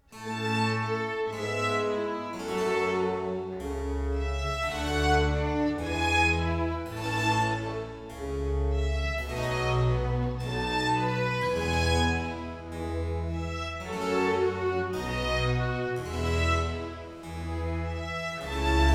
Concerto in B flat major for strings and harpsichord Andante (molto); RV 163; A. Vivaldi; Concertos and Sinfonias; 尼古拉斯 · 麦克吉根